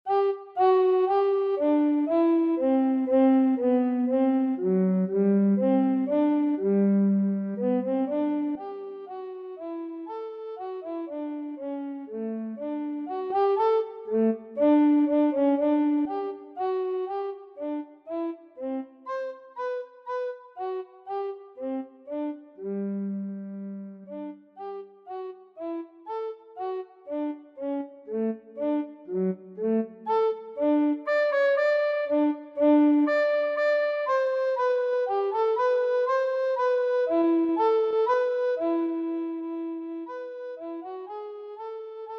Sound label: bourree bass recorder; Playback